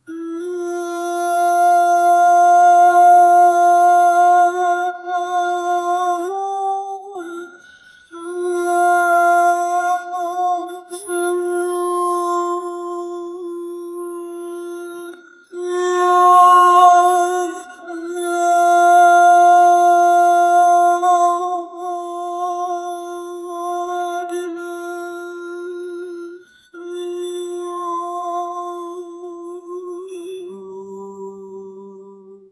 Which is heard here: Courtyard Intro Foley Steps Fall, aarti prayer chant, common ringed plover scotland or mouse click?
aarti prayer chant